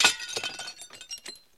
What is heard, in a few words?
Glass Break Softer